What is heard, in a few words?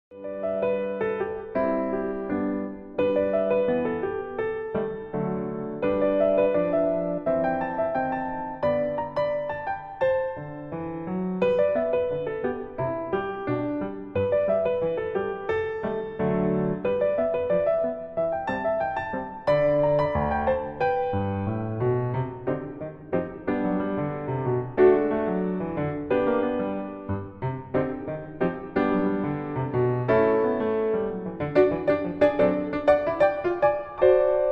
ragtime